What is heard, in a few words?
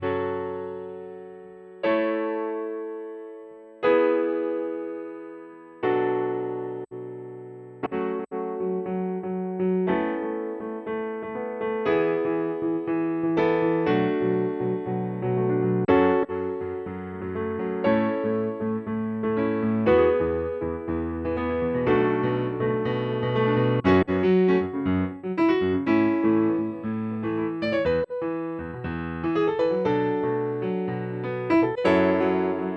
kurzweil k2700 pianos